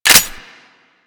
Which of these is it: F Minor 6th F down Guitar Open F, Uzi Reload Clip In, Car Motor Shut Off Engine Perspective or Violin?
Uzi Reload Clip In